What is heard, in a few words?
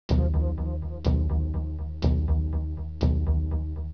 music; Egoboo